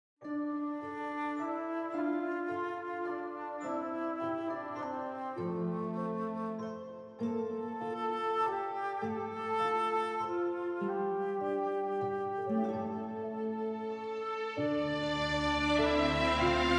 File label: Orchestral Romantic